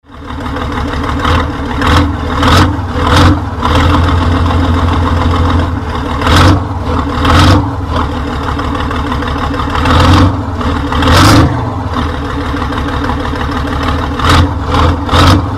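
vyhlop moshnogo traktora, exhaust of a powerful tractor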